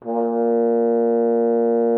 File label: A#2, FR HORN; Xsample VOL-3